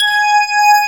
DG WAVE, DIGI SYN

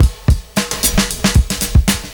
70s Breakbeats; USB Soundscan vol.46; AKAI

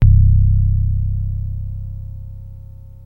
Access Virus B; Synthworx

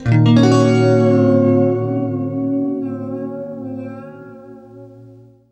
FLESH CHORDS, Guitar

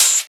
Open Hihats, Cymbals

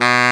BARRI B 2, REED; AKAI S-Series CD-ROM Sound Library VOL-8

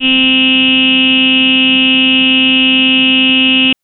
Synth Voice Ee c3

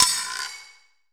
FX GILO L; AKAI S-Series CD-ROM Sound Library VOL-5; FX PERC

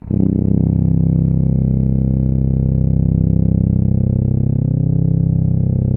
C1, Synth Line

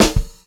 126 CL SD R, Pop-Rock Drumloops; USB Soundscan vol.37; AKAI; 90 s Sample CDs

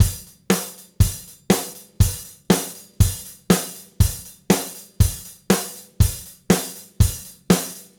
Gretsch groove 120 BPM, 1967 Gretsch Kit drums; Sonic Foundry (Sony Creative Software); Vital Drums

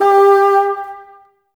BNS G, Bassoons, Wood Winds; Miroslav Vitous - Woodwind Brass Ensemble

